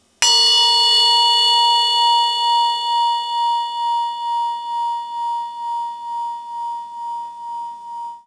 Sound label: bell mono